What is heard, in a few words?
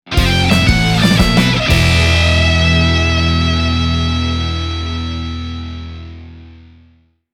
Stage Complete (Rock Version); neon inferno